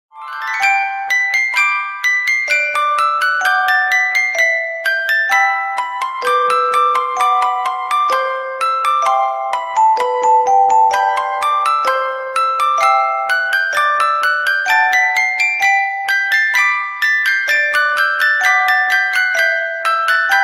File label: music box canon ringtone